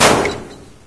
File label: bust metal, debris; mistake coop